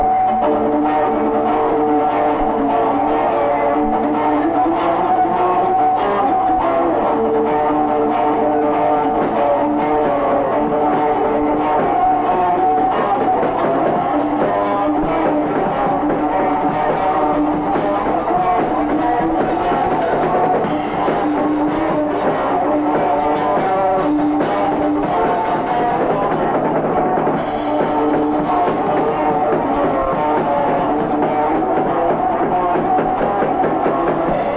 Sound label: Riff